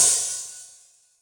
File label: HH open, Hi-Hat, Yamaha DTXpress